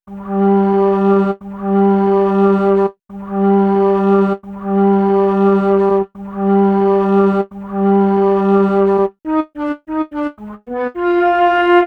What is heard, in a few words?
Airy Flutes, Cinematic Funk; Sonic Foundry (Sony Creative Software); Cinematic Funk Urban Tone Poems